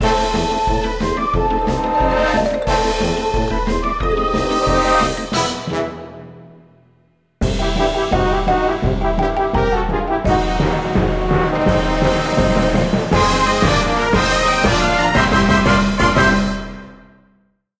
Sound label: bgm intro